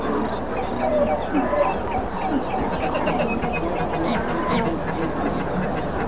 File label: harp seal sounds; marine animals; Many marine animals make sounds and songs